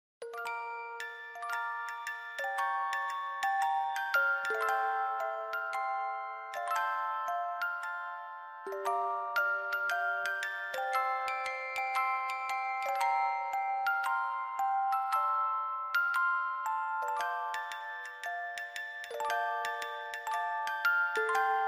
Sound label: music box ver.